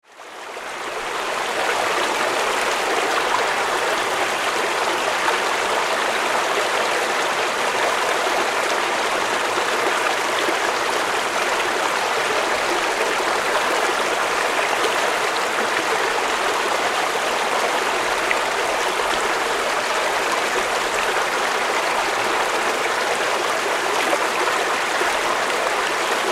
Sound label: falls stream; mystic